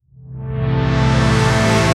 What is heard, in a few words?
epic synth wave rising